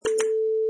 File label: Cell Phone Open